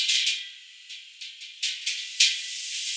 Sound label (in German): walzwerk; akustik